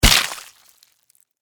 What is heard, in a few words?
Impact Skull Blood, Horror, Impact, Skull, Blood, Flesh; Blood and Guts; BlastWave